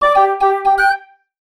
kids game win flute positive fanfare fantasy orchestral